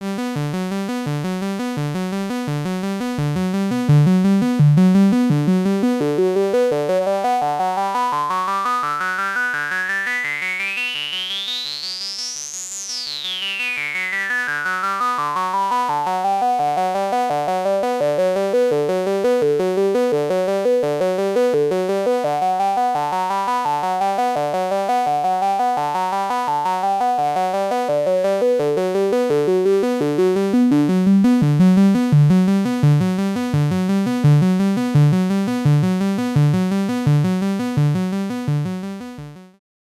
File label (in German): Korg MS20 Sequenz HP Filter